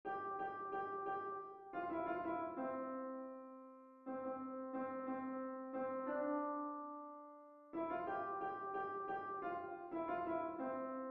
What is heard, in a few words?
play piano by ear